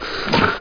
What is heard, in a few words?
ladder; Daikatana; superfly